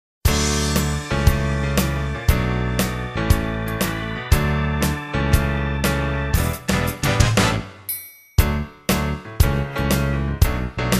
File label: instrumental